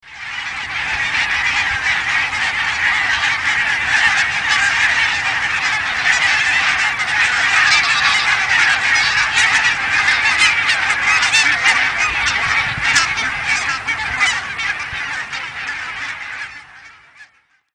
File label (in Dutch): ganzen